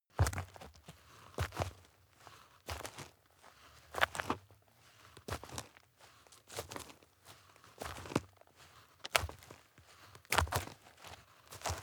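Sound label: step dirt heavy boots walk slow, footsteps; schritte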